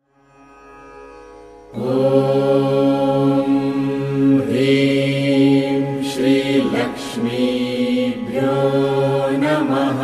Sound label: Lakshmi Beej Mantra